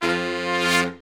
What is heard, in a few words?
BRS SHRT, R&R Horns, Sect; Roland L-CDX-03